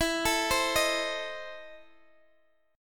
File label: E Major 7th Suspended 4th Sharp 5th E, Guitar, Open D; chord shape